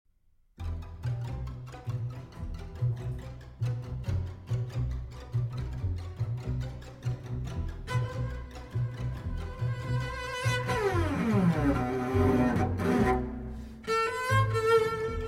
Cello and Orchestra

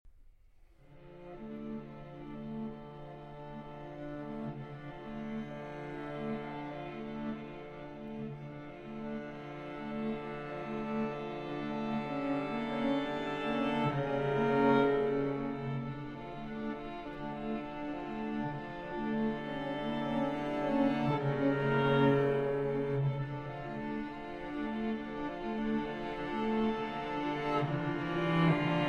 String Quartet; II. November 25

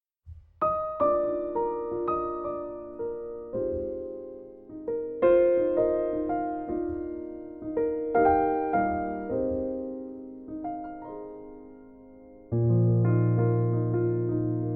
Piano Version

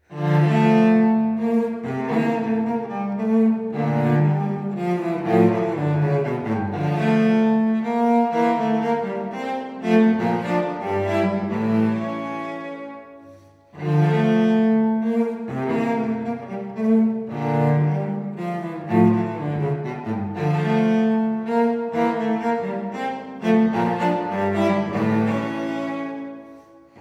Suite in D Minor, Menuets; Suite No. 2; BWV 1008

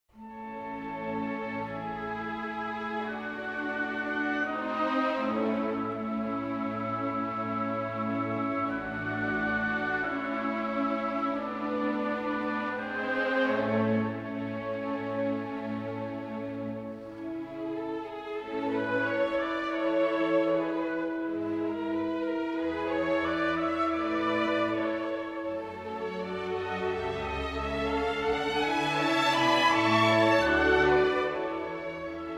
Violin Concerto in D Major, Andante Cantabile; Mozart; Violin Concerto No. 4

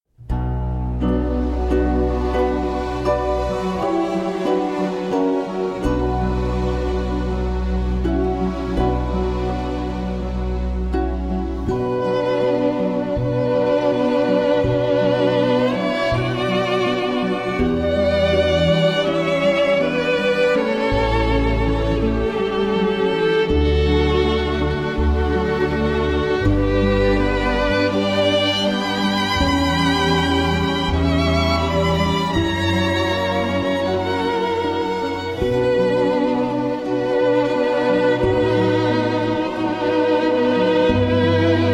3 Duets for 2 Violins & Piano; Op. 97d; Shostakovich

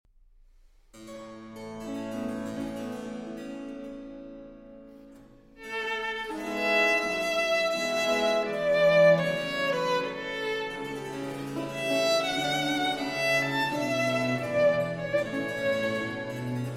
Violin Sonata in B-Flat Major, Adagio; Sonata F.XIII no 16, RV34

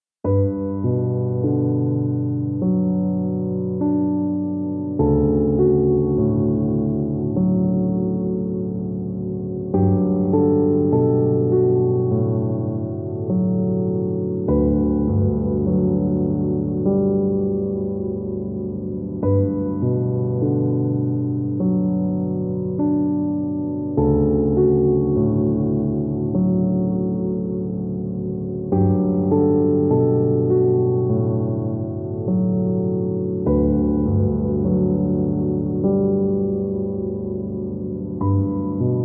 solo piano

